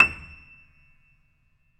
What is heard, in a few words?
BOSENDORF